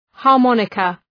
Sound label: harmonica